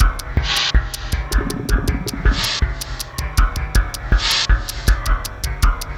RISK LP, Industrial Loops

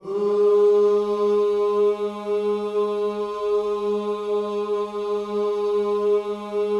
OOS G#, Vocal Gospel

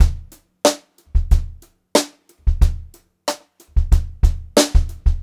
BEAT, Brushed Drum Loops; USB Soundscan vol.39; AKAI; 90 s Sample CDs

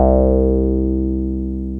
TB303 SQR, ROLAND TB-303 BASS

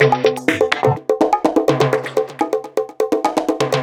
Percussion; Afterhours EDM; Electro Shock; Sonic Foundry (Sony Creative Software)

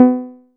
Chord; ModelCycles

